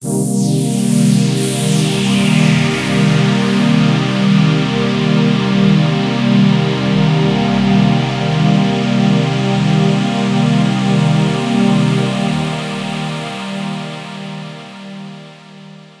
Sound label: pads rack pad Cmin7 mellow; M8; M8JUNGLEPAK1